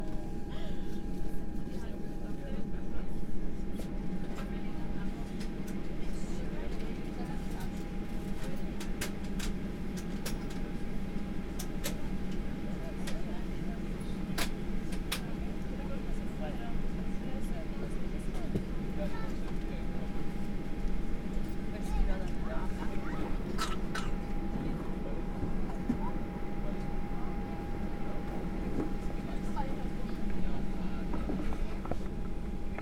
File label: Inside Airplane Airport